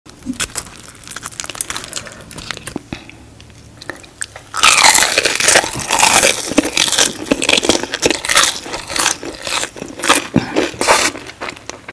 fast food, خوردن

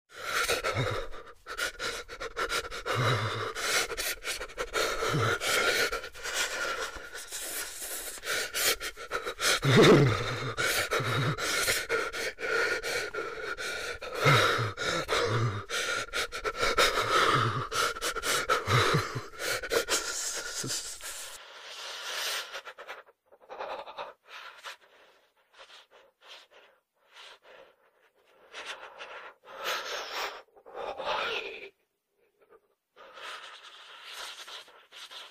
Shivering from cold; لرزیدن انسان از سرما

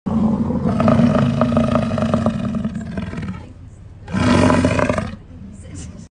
European bison; ویسنت

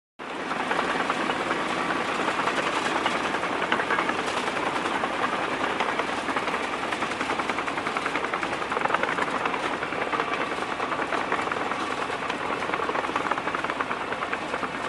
bulldozer

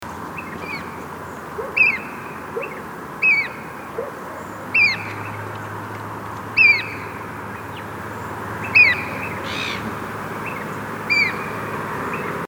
Sooty oystercatcher

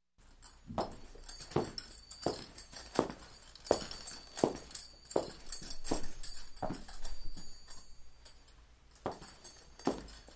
Cowboy boots; چکمه کابوی

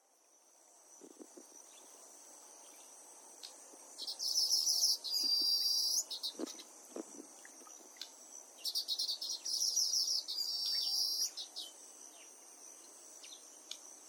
Baya weaver

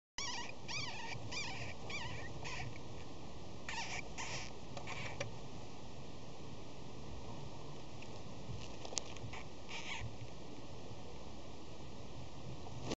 small five toed jerboa

